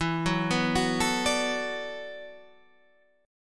E 11th Suspended 2nd

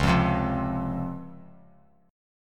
C sharp Major 7th; strummed; chord

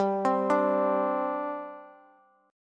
G Suspended 4th, Bass; Gsus4 arpeggiated; JGuitar

